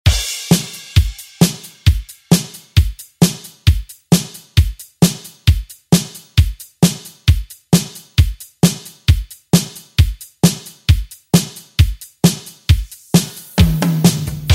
ReDrum; DJ Mhark

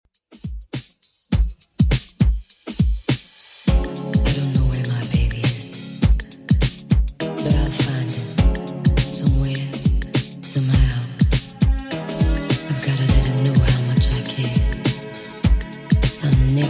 80s Redrum 102bpm

